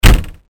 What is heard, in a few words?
car door